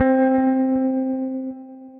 Amethyst Harp, Synth Keys

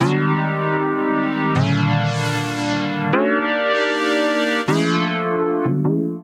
Chords D Minor 154 bpm; MIDI Clips; Skitter and Step; Drifting Back

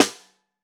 Snare Bottom

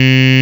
vvox, Digital Synths; Ableton Foundation FreePacks

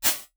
Double Closet; Convolution; Tiny Rooms; IR Samples; NI Komplete; Kontakt 5

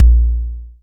analog tonal, Kickbank

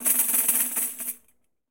Pandeiro A Roll; Latin Percussion; Drums; Drum Hits; Tambourin; Ableton; Factory Packs

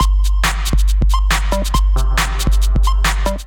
Kit Melodic 138 bpm, Drums; MIDI Clips; Aero Kit; Ableton; Factory Packs; Skitter and Step

